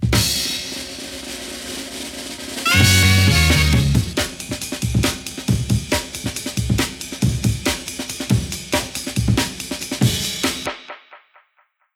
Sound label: Amen, m8 breaks